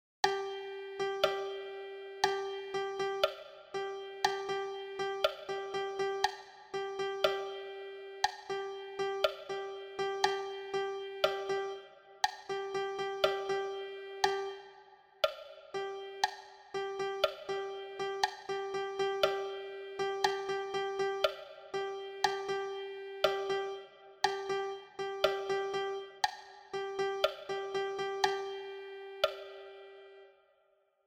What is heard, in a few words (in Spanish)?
percusion